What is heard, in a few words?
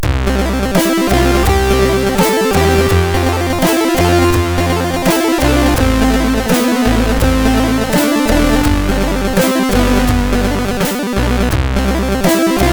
Chord, MOS6581R3; sid